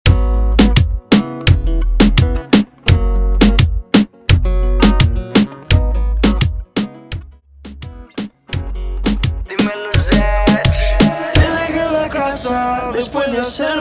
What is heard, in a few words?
Percapella Intro Outro 85 BPM